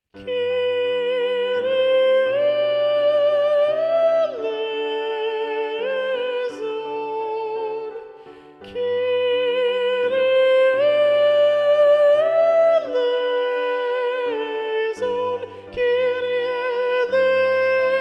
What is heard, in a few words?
Soprano; Mass No2 in G Mvt 1 Kyrie; Practice Room